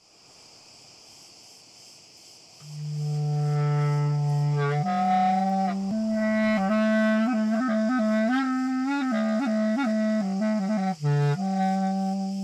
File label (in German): karidi Rau Frei Ton Vrianten Spiel